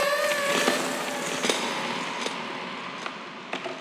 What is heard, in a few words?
126 AMBIENT TEXTURE Db